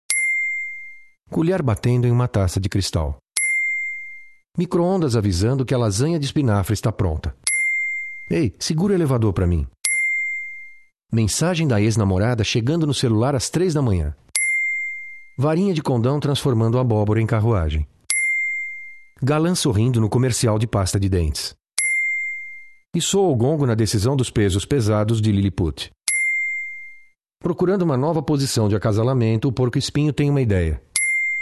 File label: CHAMPAGNE GLASS